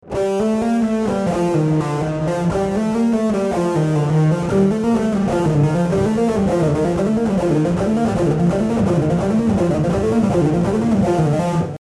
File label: legato